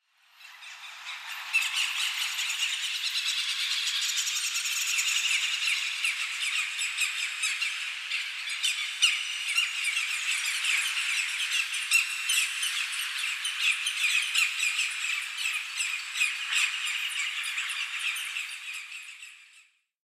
Bird Ambience - Heavy Close And Distant European Birds; Hollywood Edge; sound designer's toolkit v2